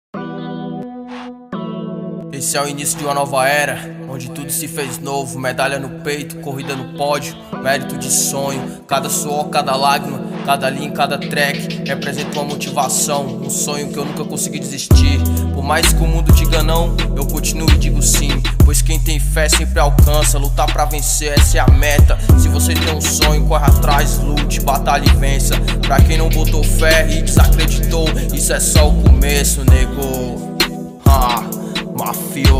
intro trap music

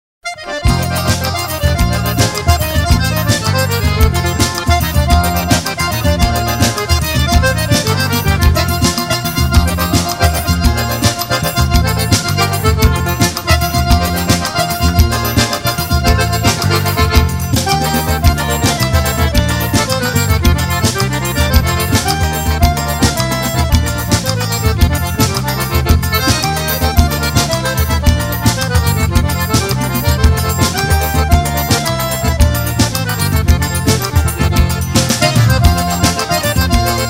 forro beleza instrumental; caru forro

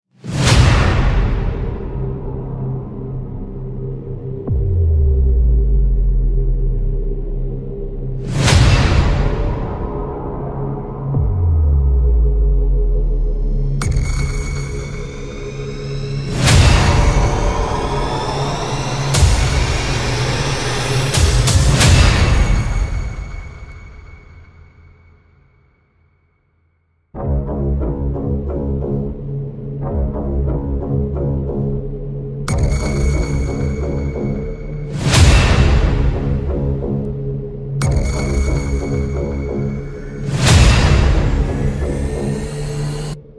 powerful epic horror music; by arrano; epic horror trailer music

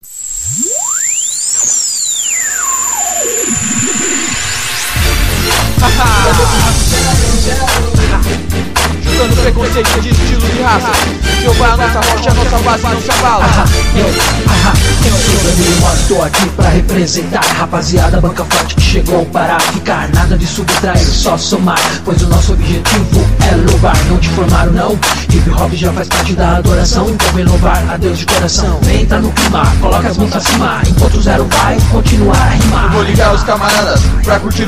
hip hop soul jazz

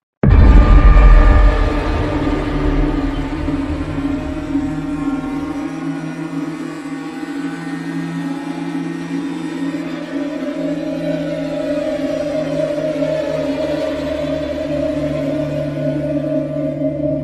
horror background music

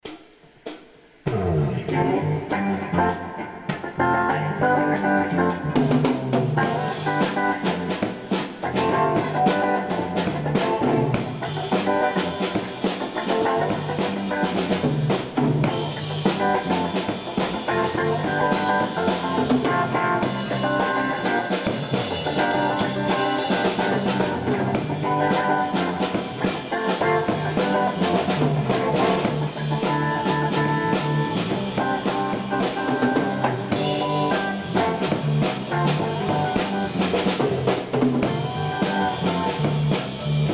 Jazz Funk

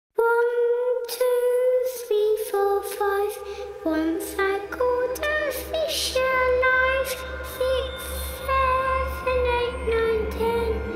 epic hybrid horror lullaby

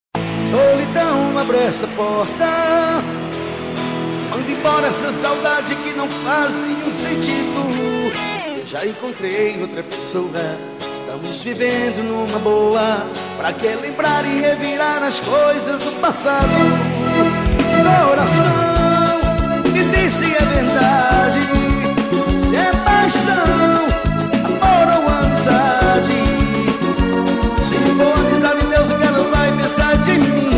Strings

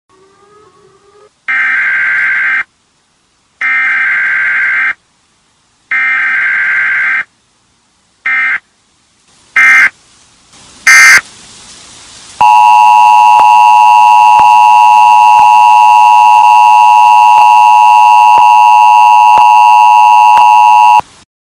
allarme della sirena sounds siren alert

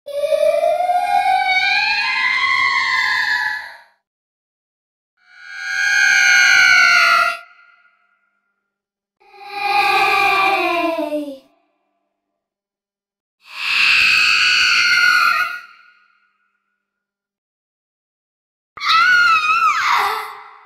horror sound creepy little girl scary sounds

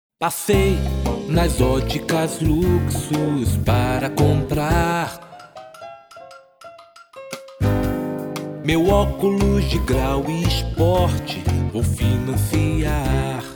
jingle; luxus

